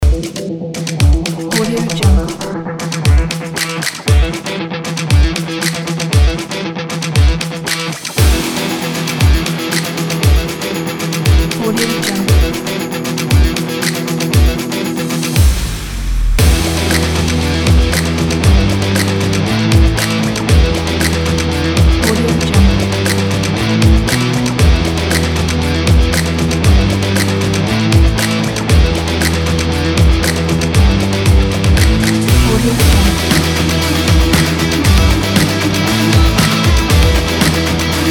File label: power drums rock guitar